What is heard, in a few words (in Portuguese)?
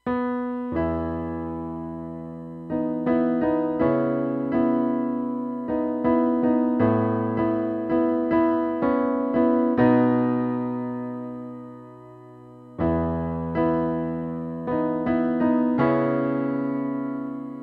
ao piano